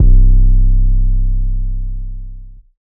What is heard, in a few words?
808 C; Advent Calendar - Day 9 - 808s